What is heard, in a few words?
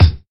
rich mid range kick drum one shot f key